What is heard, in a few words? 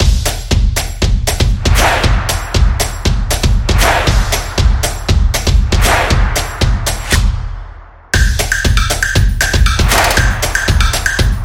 Drum Loop; upbeat drum